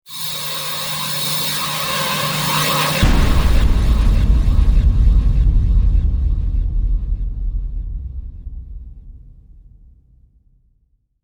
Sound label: Terror Slash, Scary metallic slash transition ending with impact hit; Stingers; Sound Design